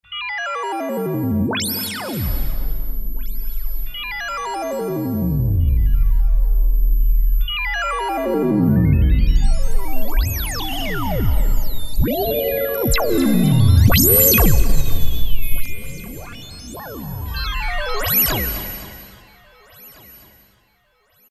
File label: VCF Reso 2600, Roland JD-990; Vintage Board